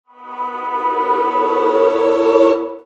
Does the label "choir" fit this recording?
Yes